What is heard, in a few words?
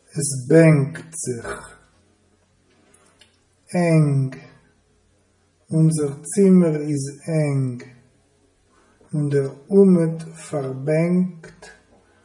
Es benkt zich